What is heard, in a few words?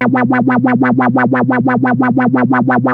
ARP 2600 A4, synthesizer; Hollywood Edge - Giorgio Moroder Rare Synthesizer Collection